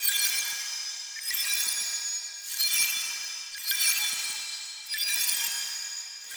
Flower Charging Loop; Adis; Warframe; Tau; BoroOverlook

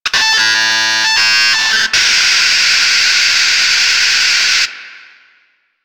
Office Fax Machine Misc

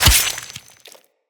Melee Sword Impact Flesh

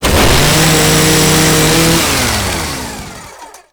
Saw Melee Swing Heavy; weapons; Warframe; Grn Ghoul Saw Player; Kuva Ghoul Saw

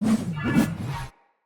Great Sword Pre Whoosh Spin; Weapons; Swords; Dendrit Ara Great Sword; Accents

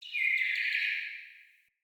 Cardinal Female Bird Song

